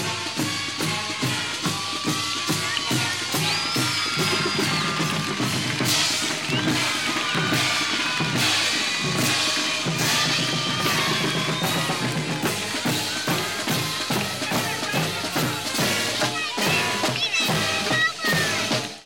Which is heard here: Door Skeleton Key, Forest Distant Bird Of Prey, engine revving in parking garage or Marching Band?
Marching Band